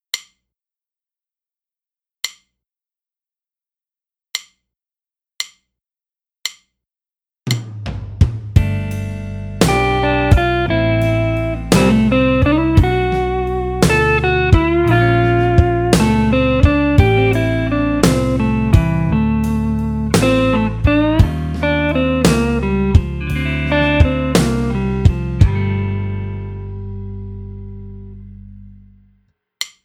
Build Up

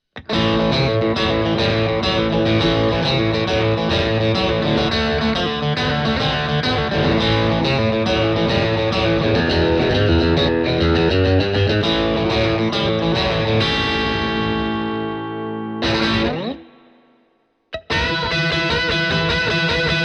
tone roland rock

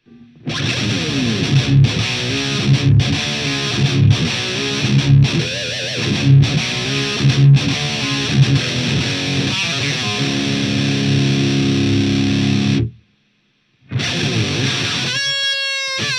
tone marshall modern

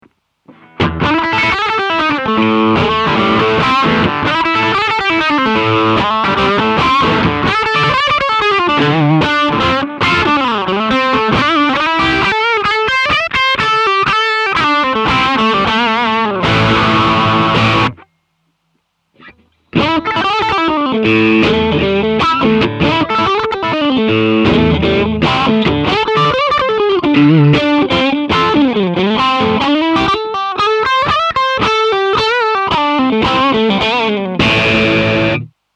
Hot Stack Plus drive; STK-S9b

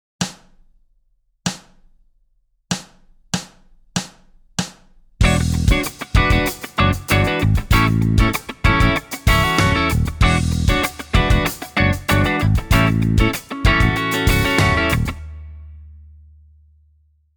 funk backing; 小指の運指を活かした譜例; ロック; ヤング・ギター８月号

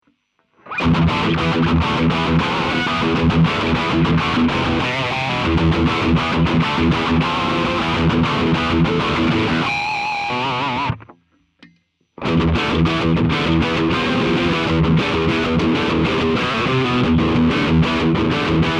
Drive Rhythm; Invader

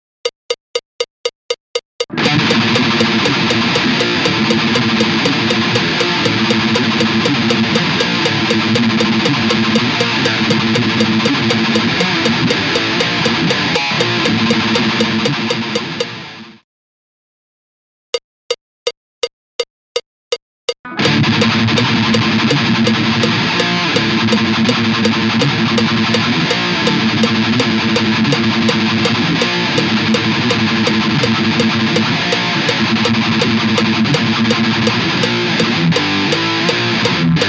thrash

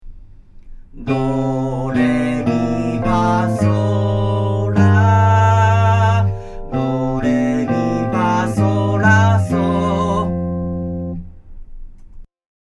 vocal

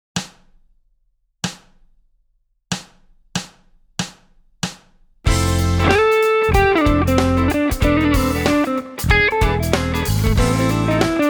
neosoul solo; 小指の運指を活かした譜例; ロック; ヤング・ギター８月号

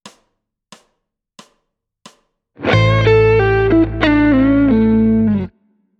blues

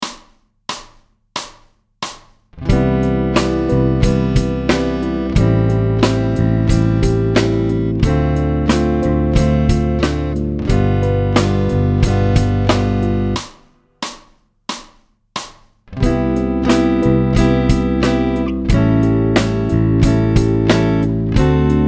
chord

